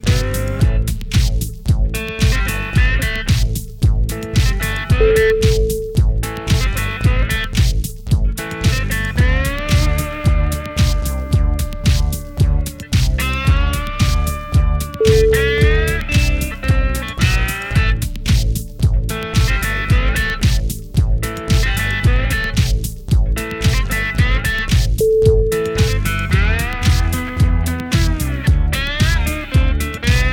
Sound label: slide in disco